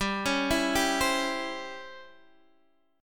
C sharp Minor Major 7th Flat 5th, chord

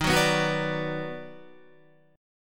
Eb Minor Major 7th Sharp 5th, Guitar; strummed; chord; 11,9,9,11,11,9; JGuitar

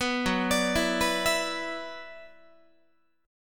Gb 7th Suspended 4th Sharp 5th Gb Guitar; Gb7sus4#5 arpeggiated

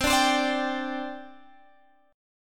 C Suspended 2nd C Guitar Open G; down; chord shape

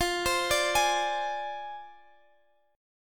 F Minor 6th F down; arpeggiated

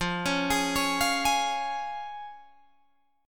F Minor Sharp 5th, Guitar Open G, chord

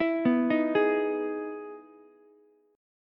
E Major 7th E down Ukulele; EM7 arpeggiated; JGuitar

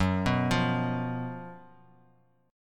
Gb Major 7th Suspended 4th Gb; GbM7sus4; arpeggiated; x,9,9,10; JGuitar

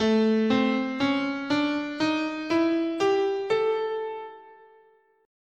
A Mixo Blues, scale

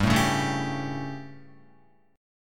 G Suspended 2nd Flat 5th chord, Guitar; Gsus2b5 strummed; JGuitar

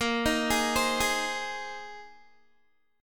A sharp 7th Suspended 2nd Suspended 4th, Guitar; A#7sus2sus4; arpeggiated

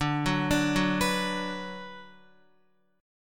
D 6th D down Guitar, chord; D6 arpeggiated; tuning 4E,4A,5D,5G,5C,6F

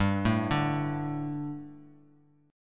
G Suspended 2nd G, down; Gsus2 arpeggiated; JGuitar

